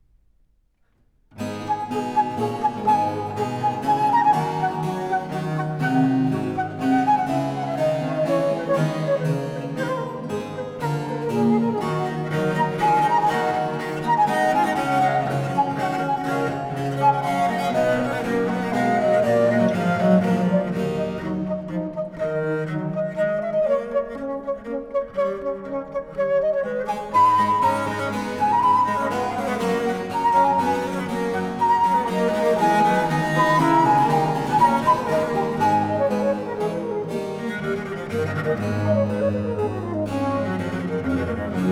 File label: Sonata en la mineur - Allegro; Telemann - Trios & Quatuors (La Reveuse); 2014 Mirare; TWV 42a7